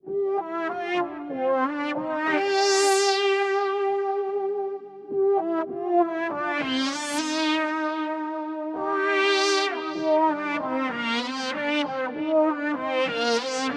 Boss SY 1000

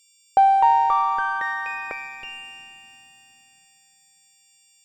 Modal 008 VCF FM Piano

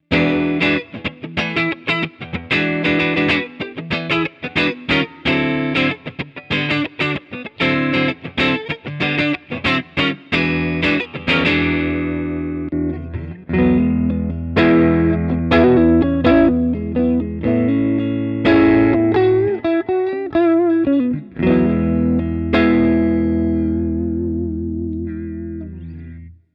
Quad Cortex Clean Basic

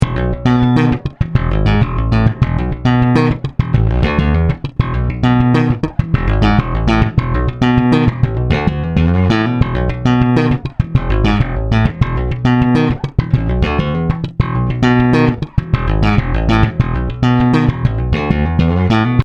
slap solo

